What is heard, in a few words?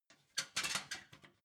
ladder metal handling